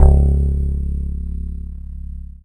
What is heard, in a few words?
C#, Synclav FM PLUCKED; Big Fish Audio